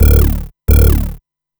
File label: bass, dance